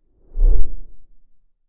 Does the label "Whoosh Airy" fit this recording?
Yes